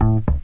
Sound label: BAS TON; E-Lab; X Static Goldmine 2; akai